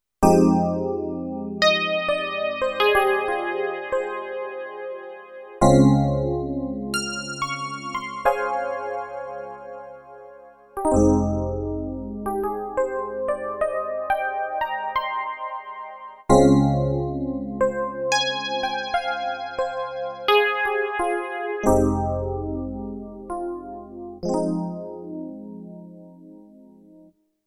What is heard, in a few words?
Yamaha NP32 E-Piano